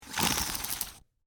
Cloth Tape Measure, Industry, Cloth, Tape, Measure, Reel, In, Fast; Hand Tools; Blast Wave